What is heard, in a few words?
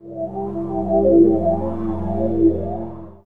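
Pad Synth; Equipped Music; Loungin House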